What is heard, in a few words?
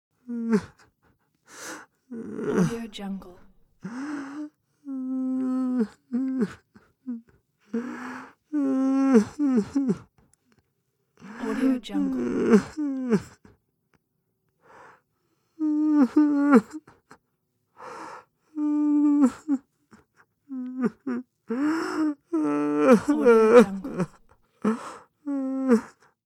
male crying soft